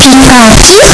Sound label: pikachu cry; pokeyellow